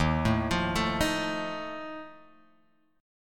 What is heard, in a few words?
Eb Suspended 2nd Flat 5th Eb Guitar Open G